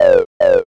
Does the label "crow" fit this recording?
Yes